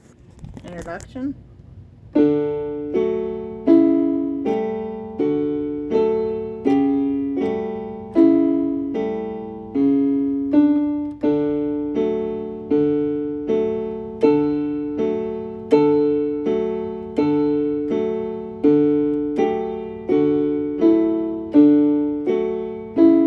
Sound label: Trumpet faster; Trumpet Tune